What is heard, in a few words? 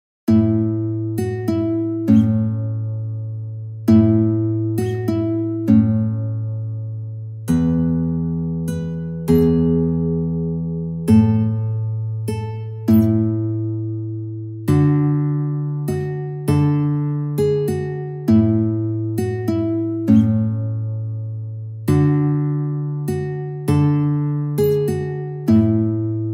silent night guitar solo; christmas